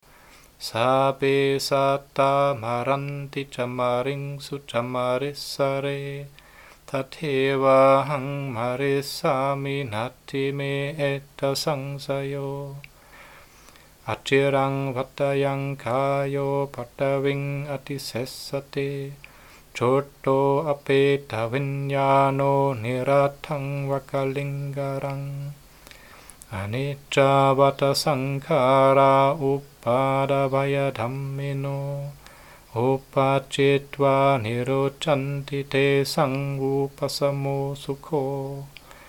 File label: Pamsukula Chants